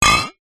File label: glass bottle wine side down on concrete ground; foley